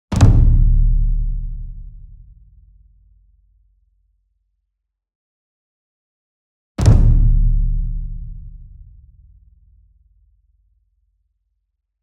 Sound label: ENSEMBLE DRUM Taiko Flam Near; boom library; Cinematic Strikes Construction Kit